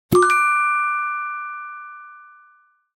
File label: Alert notification